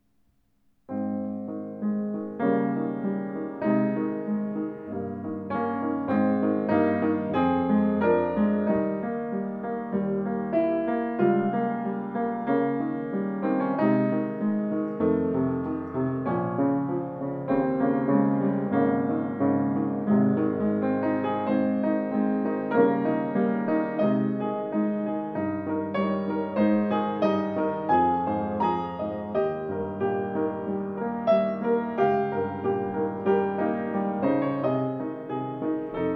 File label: Bechstein 12N; 114 bechstein 12n weiss